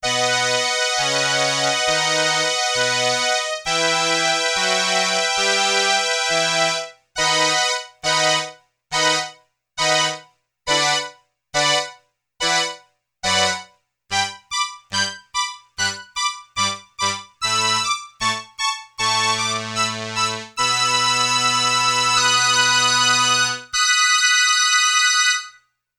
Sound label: STRINGS II with Ensemble II, Roland RS-202